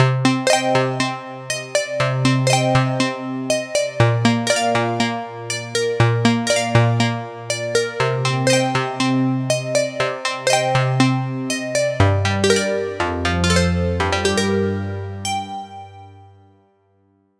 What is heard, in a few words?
Nylon Guitar; straightliner